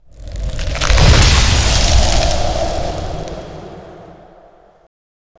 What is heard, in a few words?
dragon attack; dragon penalty attack; mono